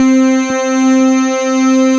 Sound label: Synthesizer Middle C Notes, Lead